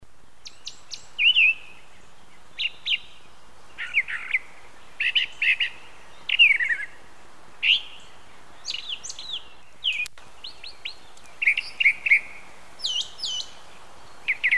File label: thrasher; Aves